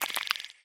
slobber, clams; infestation

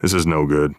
scared emote; vo; Counter Strike Model Pack; CS:GO; phoenix coop

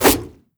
attack whoosh, antlion; creatures

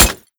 manhack, bullet impacts; physics; Half Life Model Pack

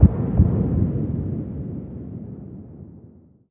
smoke grenade explode distant; weapons; Counter Strike Model Pack; CS2

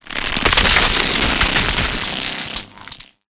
shockwave tell, mechanical units, substation; Half Life Alyx

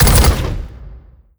step approach hop down, dog; npc; scripted

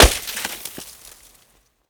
snowball hit; player; winter

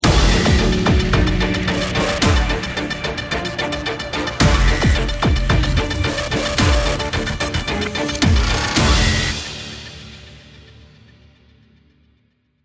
round ten sec count

